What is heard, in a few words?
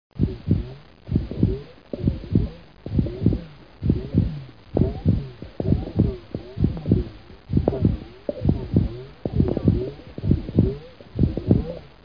heartbeat; PLANET X